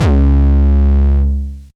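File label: 808 distorted, C#1, kicks; Mbase 11 Kicks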